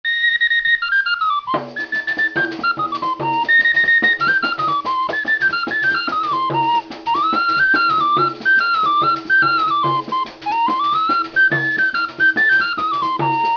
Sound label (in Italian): flauto canna